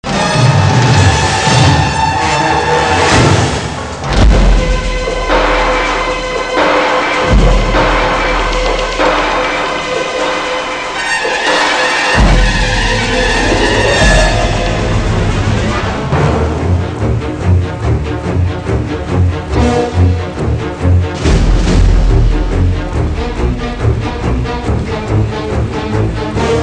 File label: SHOOT; DEMOMAN